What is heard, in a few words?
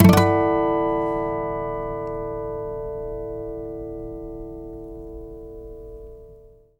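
Nylon Guitar harmonics; E-MU Producer Series Vol. 8 – Platinum Phatt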